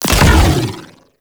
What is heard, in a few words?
Disc Fire; Warframe; Corpus; Corpus Railjack; Spec Ops Riot Biped; Riot Moa